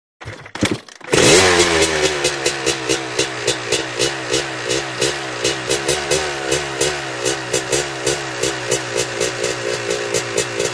Motorcycle; Start / Away; Trials Bike Start Up And Away.